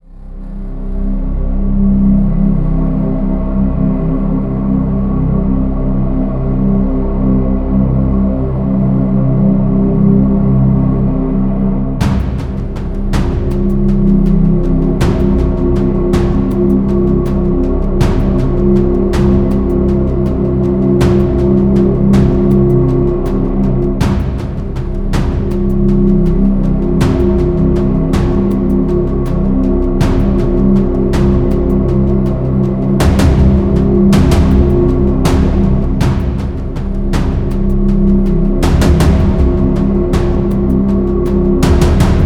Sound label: tension